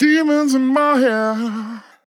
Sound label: MAN LYRICS Fills 120bpm Am; demons in my head